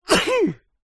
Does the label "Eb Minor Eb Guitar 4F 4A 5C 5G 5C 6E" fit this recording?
No